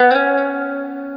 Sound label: Guitar & Bass; Quantum Leap; 90's Sample CDs